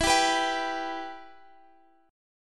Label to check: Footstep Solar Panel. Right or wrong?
Wrong